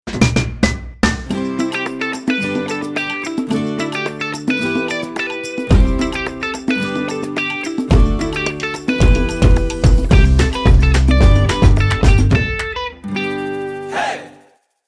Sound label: Electric Lead 109bpm